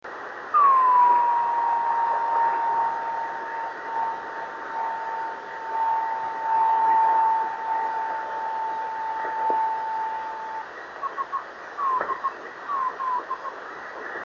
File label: 26790KHz, drift net beacons